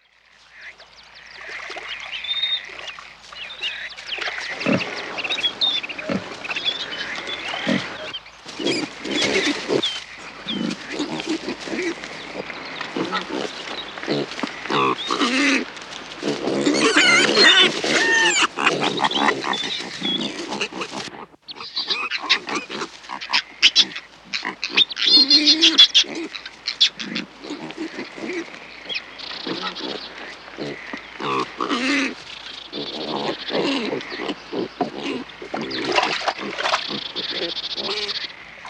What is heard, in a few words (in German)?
Schwarzwild Bache Frischlinge; Schwarzwild Bache mit Frischlinge; haarwild